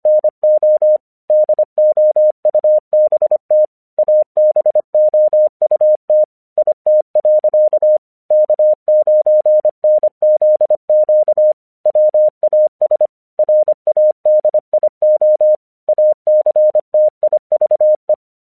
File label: English 25wpm